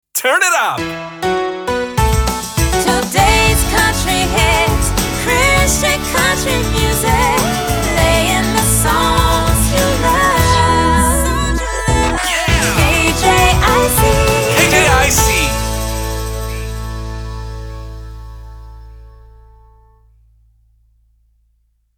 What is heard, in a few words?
Sung Jingle, 100bpm D Major, SLOGAN; KJIC 2020